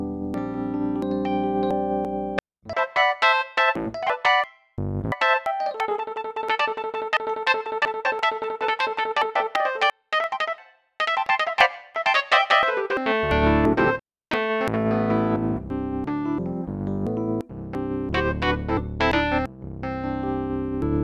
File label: KEYS; MyMix Recordings NAMM 2018